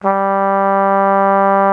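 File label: cornet G2 left; TUBA +CORNET; Greytsounds VOL-1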